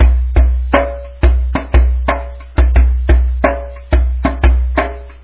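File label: drum solo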